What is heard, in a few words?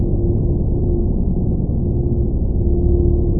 abstract air, tones; source engine; portal2; ambient